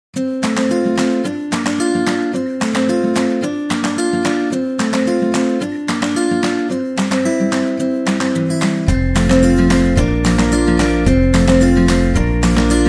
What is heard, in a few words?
Happy Acoustic Folk Music